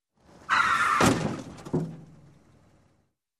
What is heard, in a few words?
Car Crash on a Wood Surface